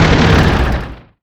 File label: ht stop